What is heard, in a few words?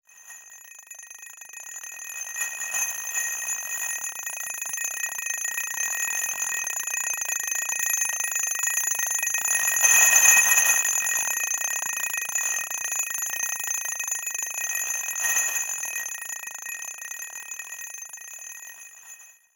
scraper hi